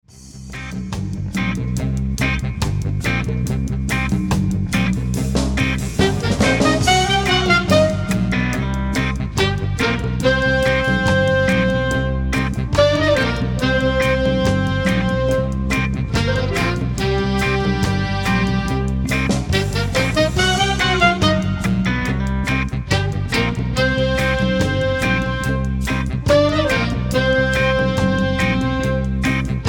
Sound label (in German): musik probe konzert; Take four